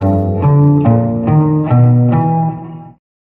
guitar

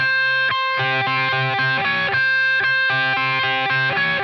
guitar high range; Loops; Phonky

